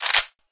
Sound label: rifle slide forward, ak47; weapons; source engine; left4dead2